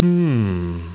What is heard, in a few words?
HUMM; BattleSNDS